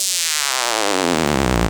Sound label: FX Scratching; vengeance essential club sounds-3